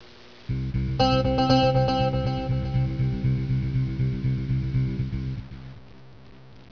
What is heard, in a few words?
echo guitar